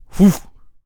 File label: Protector Attack Vocals